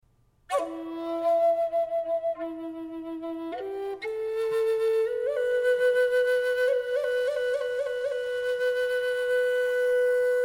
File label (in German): indianerfloete